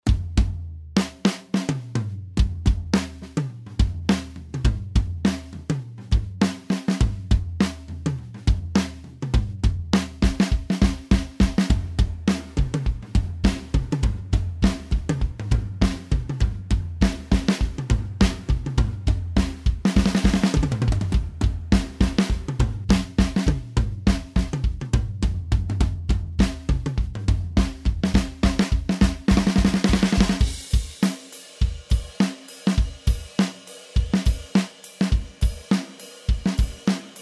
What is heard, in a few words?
drums only, hall; Studio Hoell